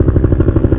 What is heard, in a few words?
helicopter distant loop; SpecOps2 MPlayer Demo